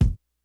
FUNK, DRUMS; Kid Nepro; Elite

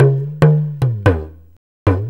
6-8 Talking Drum, Perc Loops; Drum Tools

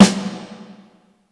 Snare, Percussion; Giga Samples Collection; ELQ-DrumQit; rock toms

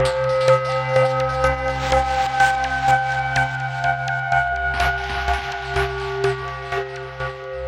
synth 125 ambient G F G C; Transmission-X

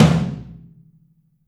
Drums Percussion Kit1 Room Mic

